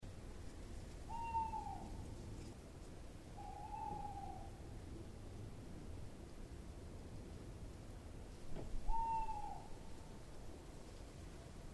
Eule

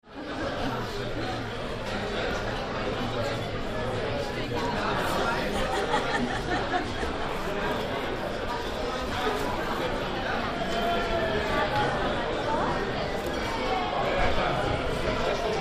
Brauerei Koeln

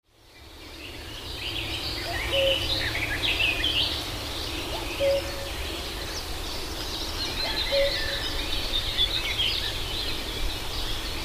Kuckuck

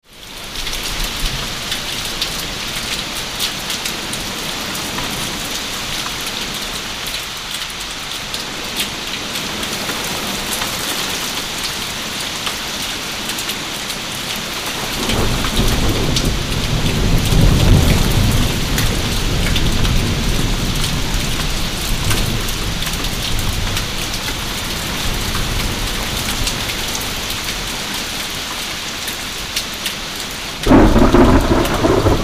Gewitterregen

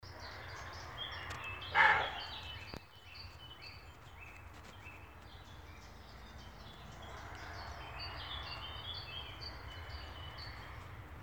Rehbock schreckt

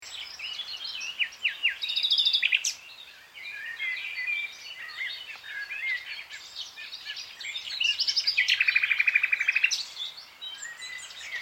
Nachtigall

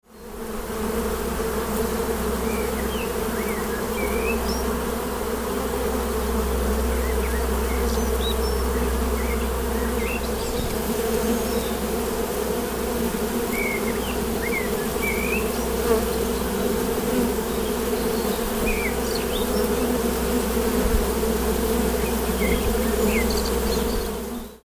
Bienen

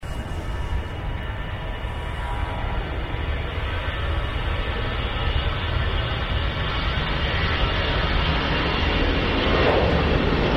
startender Jet

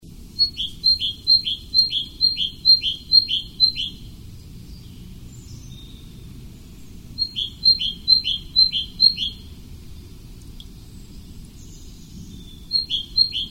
Meise

